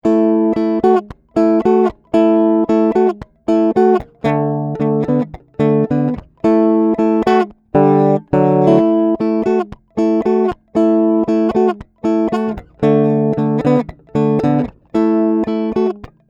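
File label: DIRECT CHORDS, bass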